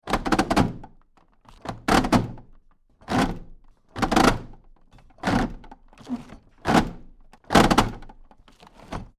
Ring Binder Hole Punch, Various punching thru paper; Miscellaneous Office; Tom Hutchings